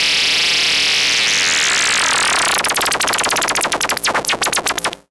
Odd Modular; radiosonics

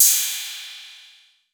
PERC 808

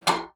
LPLK F#5, SPINET; Xsample VOL-7

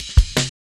Cymbal Steppa Cut, Drum Loops 165.5; Zero-G Jungle Warfare 1, 2 & 3

